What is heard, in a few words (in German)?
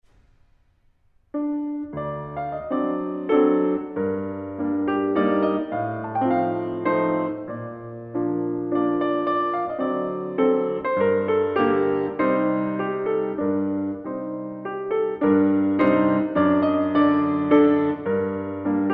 Mazurka G Moll; Chopin